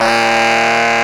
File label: SAX T. GROW, Tenor